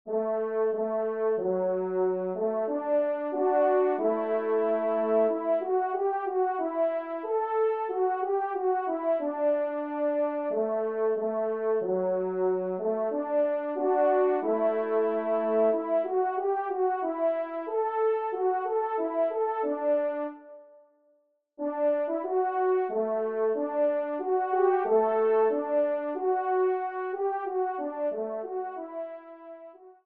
trompe

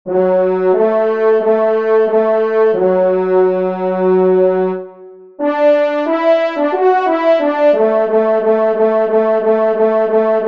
Retraite, trompe; Les Loisirs du Chasseur n°12; Henri Sénée

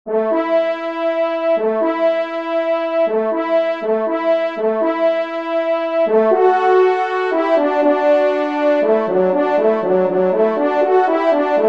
trompe; Retour de Chasse; 2e Trompe; Victor Viney

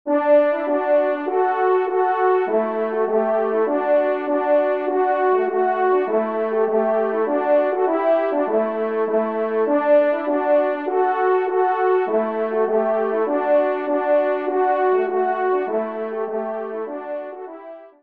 Tambourin Do Maj; 4e Tambourin; Corrette

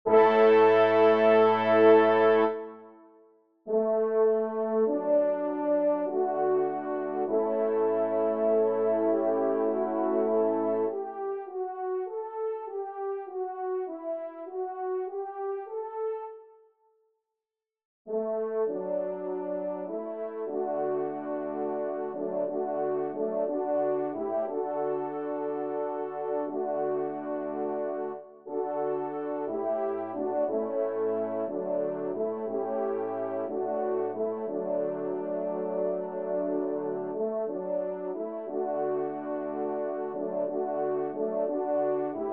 Hymne, trompe; Hymne à L'Aurore; Jules Cantin

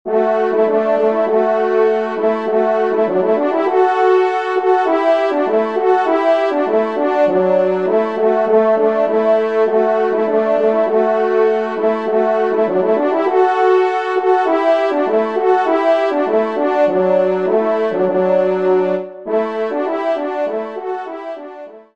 Fanfare; Loisirs du Chasseur; Henri Sénée

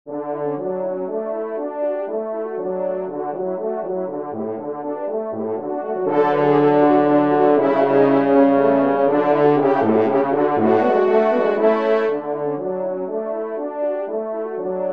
Trio Cors

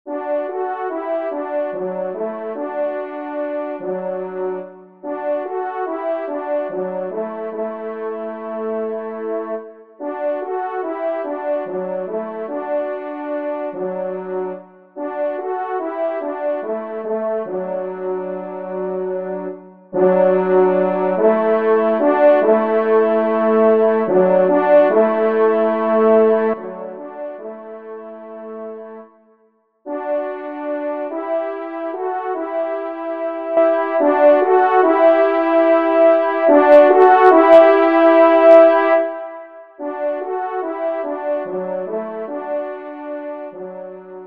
trompe; La Reconfortante; Ed Angot; Mayenne

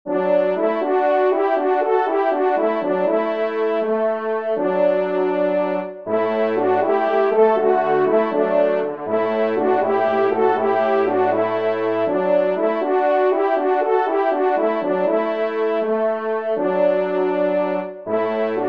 Fanfare, trompe; Loisirs du Chasseur 4 2e Fanfare; Henri Sénée; Trompes ou Cors

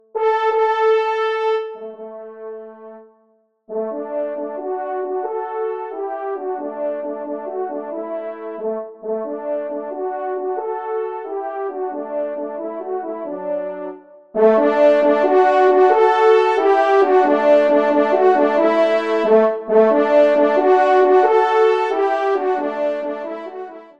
TRP; Guillaume Tell; Rossini; Pupitre 1° Cor

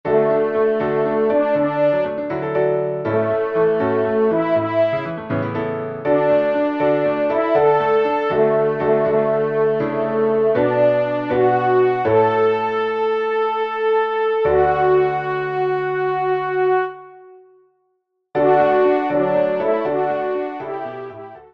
Agnus Dei Trp et Pia; Messe d'Estival

